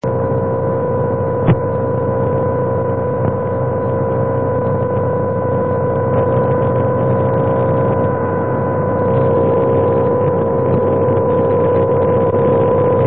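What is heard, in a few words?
am, satellite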